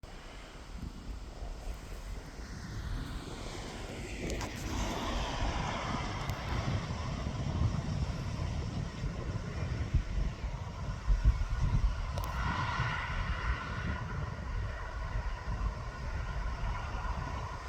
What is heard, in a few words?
fox